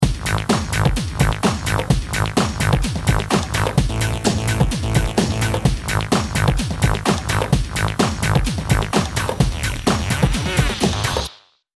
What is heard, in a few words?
round ten sec count, music